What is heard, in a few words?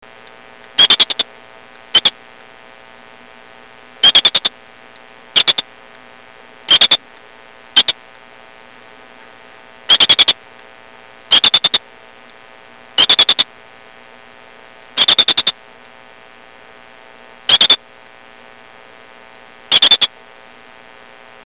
P vittatus Female Near, chants